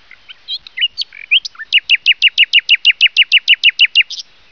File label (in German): Nachtigall